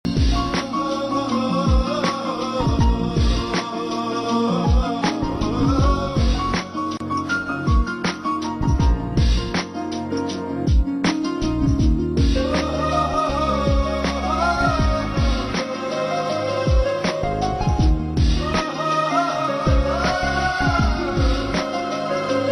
instrumental, ringtone; blues

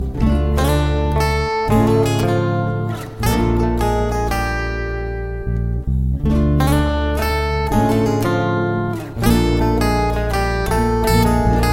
guitar

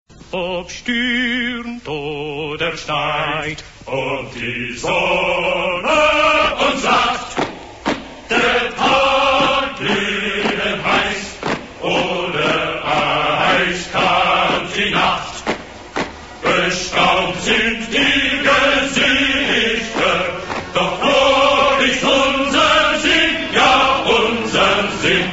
march; panzerlied

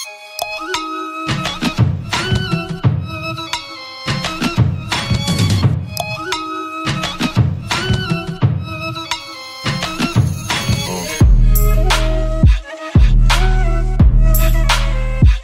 Arabic Beat

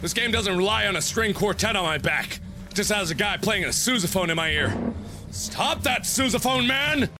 Sousaphone

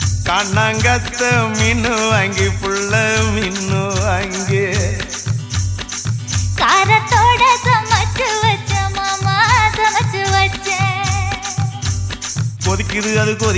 tamil gana songs

